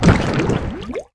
Goop; Flak Cannon and Pulsegun

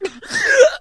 gasp

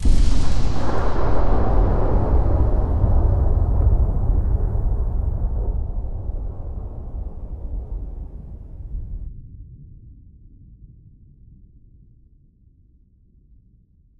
vortex flash

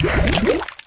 goop; Flak Cannon and Pulsegun

